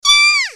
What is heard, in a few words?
kyaaa